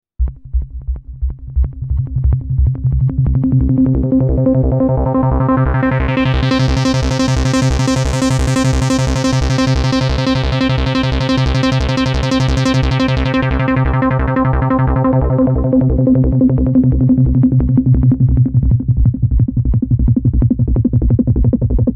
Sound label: Xenophone ARP VCF Envelope